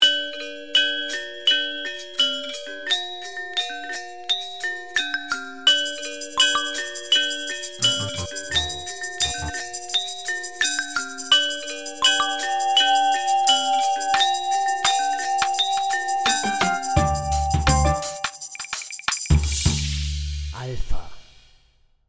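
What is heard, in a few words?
Logo Gamelan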